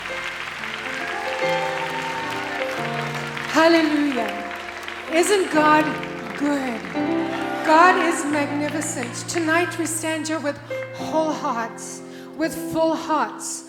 Choir

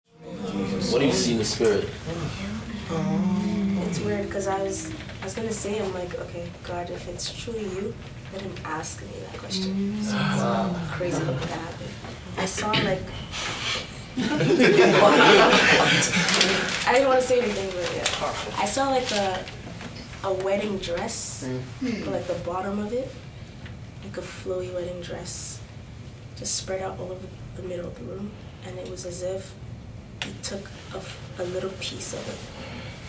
Interlude

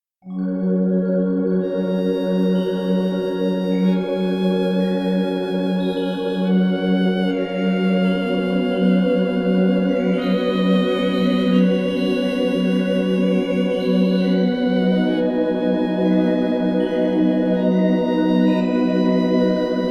Sad Background Music

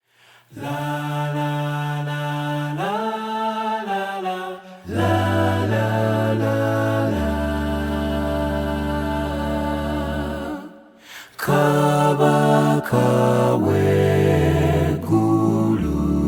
Acapella

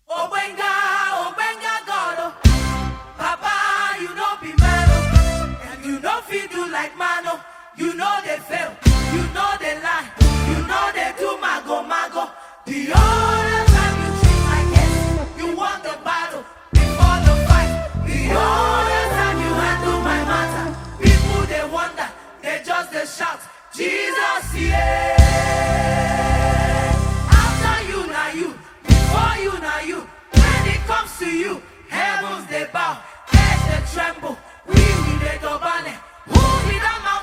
Holy Chant

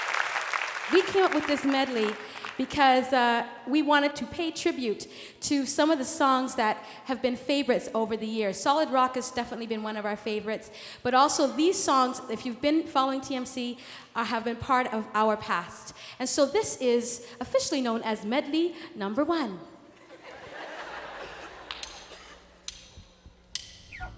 Interlude, Mass Choir

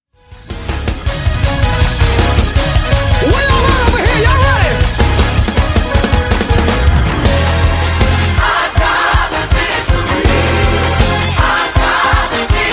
Victory Chant

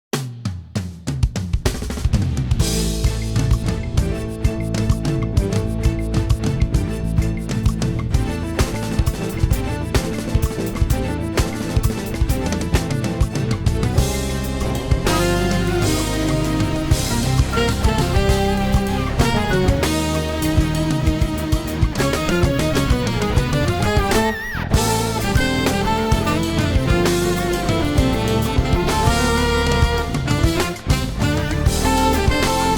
Instrumental

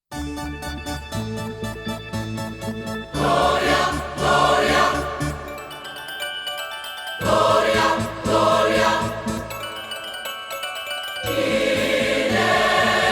Gloria In Excelsis Deo, Gospel Choir

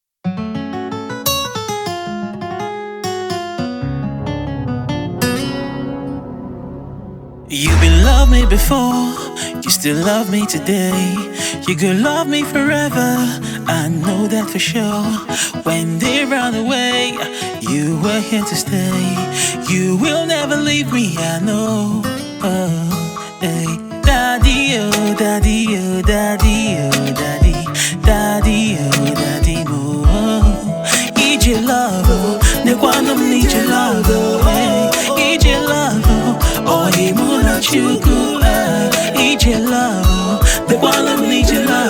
Acoustic Version

